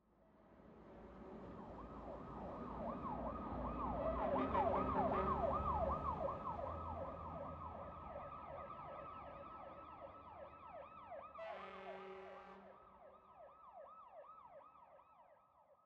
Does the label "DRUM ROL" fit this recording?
No